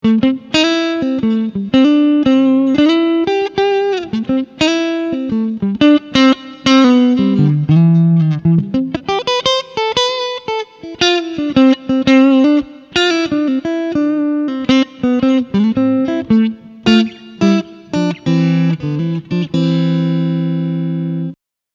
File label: Clean Lick Front Singlecoil